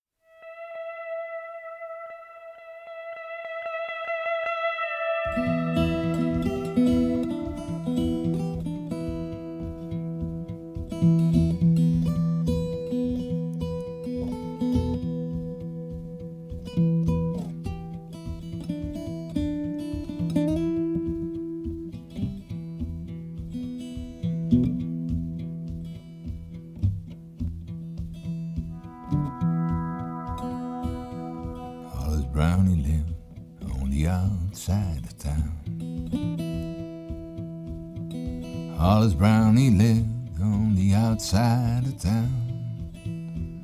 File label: Ballad